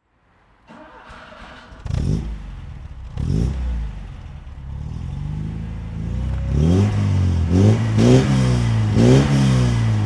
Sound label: Lancia Flavia 2000; 1970 Lancia Flavia Coupe 2000